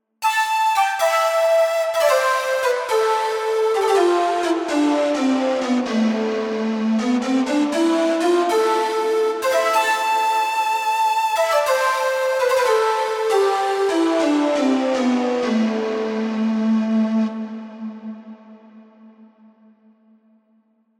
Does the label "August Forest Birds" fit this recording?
No